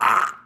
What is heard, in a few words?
Scream